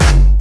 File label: Jump, kicks